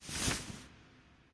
cloth; smite

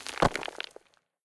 debris; Smite; Cabrakan

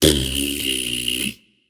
nv tongue; smite; voicepack; morgan le fay

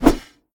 whoosh; smite; gilgamesh

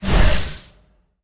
fire; Smite; Tiamat

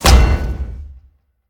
atlas hit; smite; god atlas

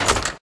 armor spin; smite; vulcan

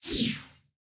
recall fall; Smite; Baron Samedi

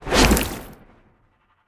spear throw; smite; Anhur

